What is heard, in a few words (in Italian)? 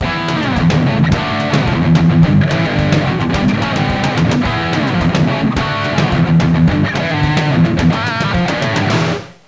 suonerie musica rock